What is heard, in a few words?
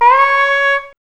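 JAZZ TONE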